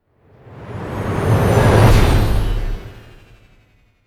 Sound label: flyby